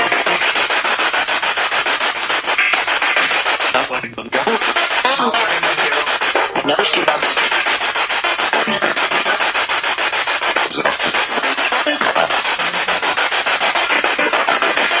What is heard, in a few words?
Spirit Box; Attic Small Room